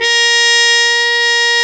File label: BRS RCA TP, Harmon Dry; Roland L-CDX-03 Disk 2